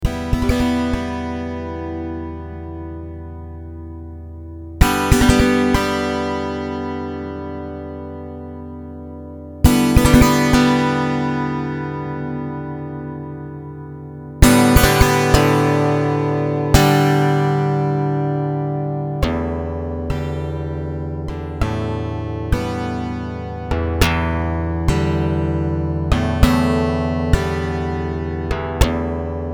Two Guitars Impro

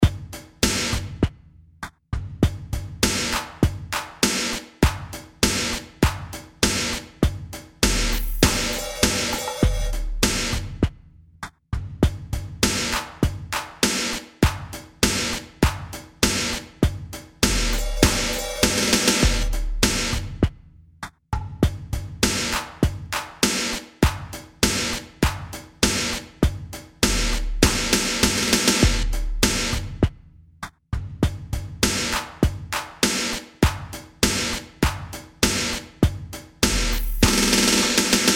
100bpm Jamstix Elektro

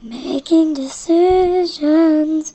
vocal seed

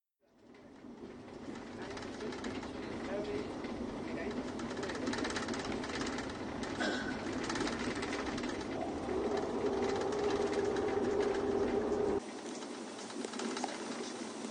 Soundscape

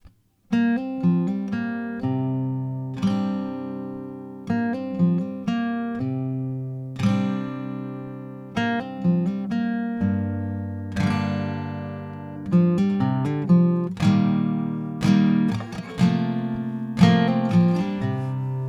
GTR

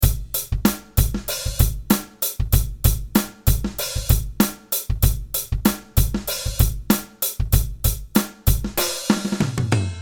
beat

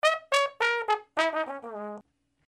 brass loop

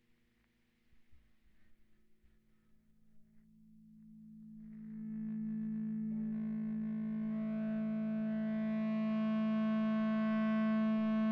A Drone on Ebow

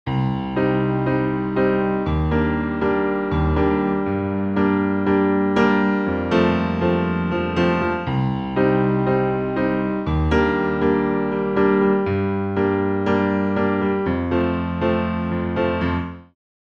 piano loop

